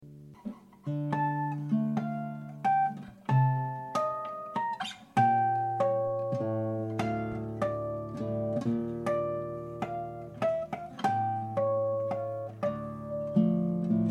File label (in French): Flageolett Harmonics Enregistrement DAT de salon Pas deffets sono, guitars; par Silvestre Borras